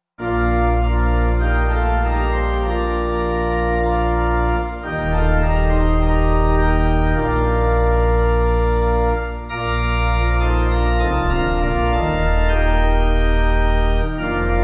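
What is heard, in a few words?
Pipe, Pipe Organ backing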